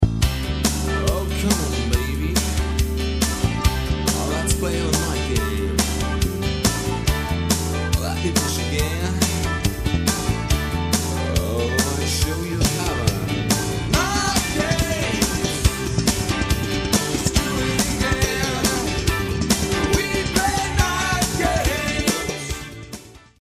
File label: bassline